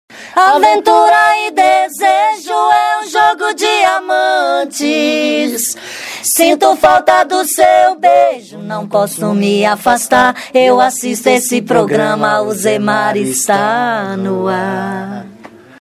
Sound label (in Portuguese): Vinheta Cantada